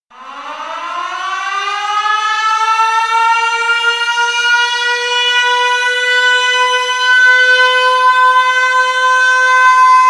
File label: Siren Sound